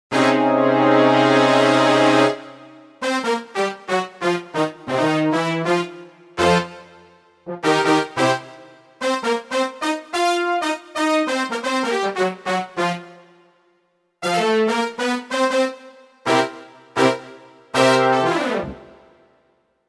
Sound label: SFZ Brass